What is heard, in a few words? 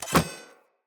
Spear Fast Equip; Weapons; Player